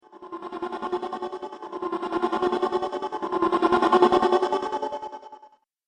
Throbbing Ghost Pulse; Sinister, Scary, Spooky Or Just Silly

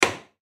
Ship Window Port Unlatch; Transportation Watercraft

